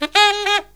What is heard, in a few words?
SAX; USB Soundscan vol.29; Killer Brass Riffs; AKAI